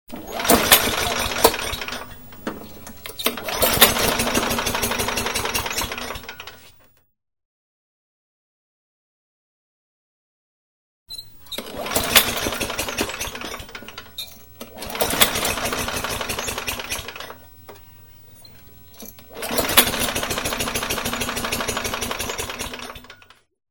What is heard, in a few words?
motor cycle starter; SOUND EFFECTS1